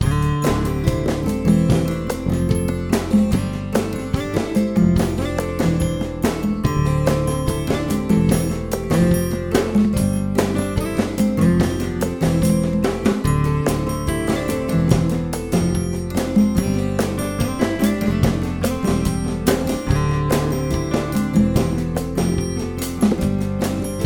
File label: acoustic bossa